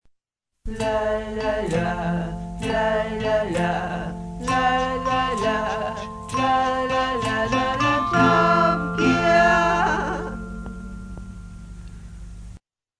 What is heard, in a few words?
jingle; topgear